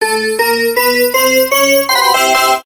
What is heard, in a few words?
addfreespin; games; Bells On Fire Rombo; slot